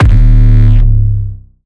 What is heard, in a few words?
Bass